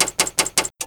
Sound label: relay, Cockpit; A32X